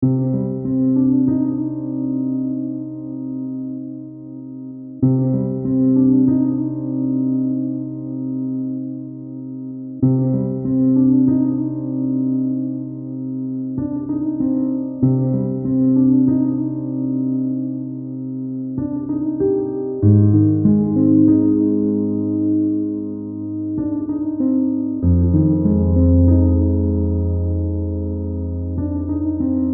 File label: Rain